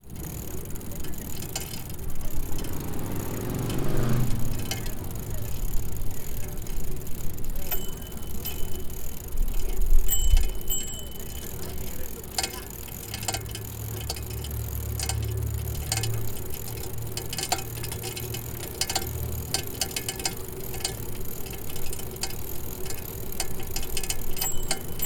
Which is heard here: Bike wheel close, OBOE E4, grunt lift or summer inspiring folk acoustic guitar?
Bike wheel close